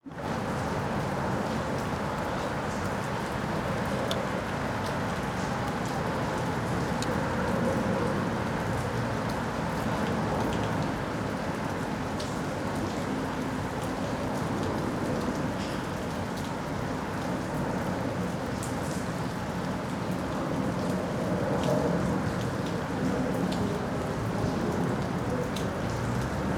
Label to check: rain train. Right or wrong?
Right